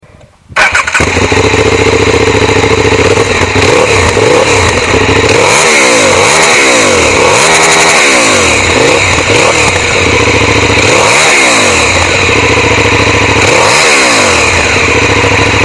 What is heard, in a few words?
KTM Superduke 990